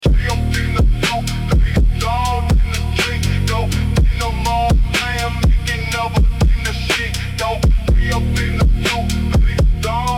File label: phonk slowed phonk